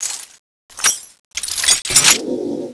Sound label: void pistol reload; weapons